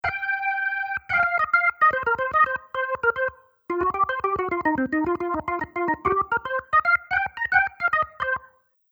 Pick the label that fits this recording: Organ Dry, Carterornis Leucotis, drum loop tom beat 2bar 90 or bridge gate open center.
Organ Dry